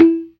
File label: PERC, ORGAN; Keith Emerson Signature Series